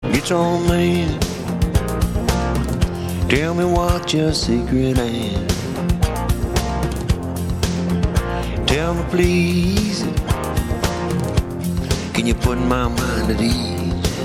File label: guitar